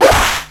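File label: Battle Club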